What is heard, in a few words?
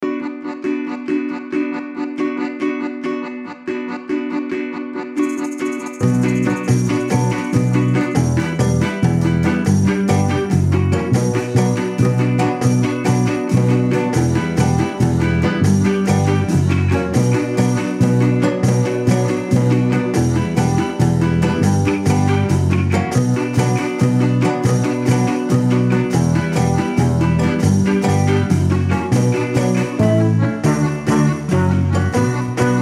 Cetvorno Sopsko Horo minus1